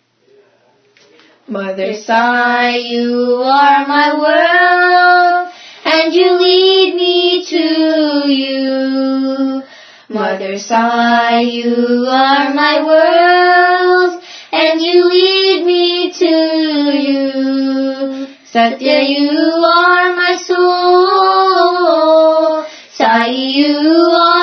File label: Bhajan; MotherSai